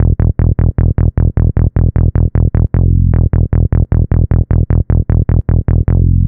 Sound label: Boosta Bass; Dr. Fink's Funk Factory